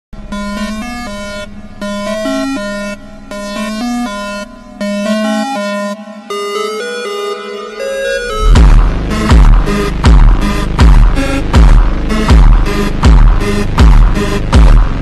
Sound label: slowed brazilian phonk bass bassboosted phonk funk music